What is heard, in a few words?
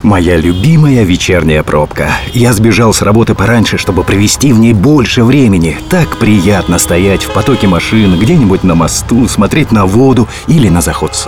Viola; Vecher